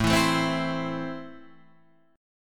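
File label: A Minor, Guitar Half Step Down, chord strum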